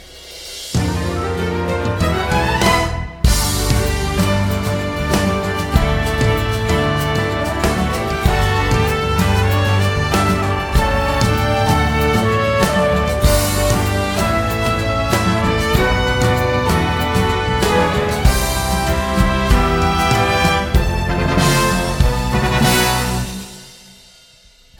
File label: audition accompaniment